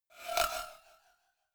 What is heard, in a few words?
cowbell scratch hit clank muted